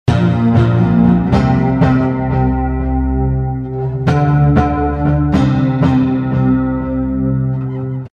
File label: Bass Amb Chords Bb,C,D,C 119, Electric